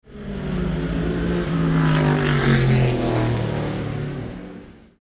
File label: Monza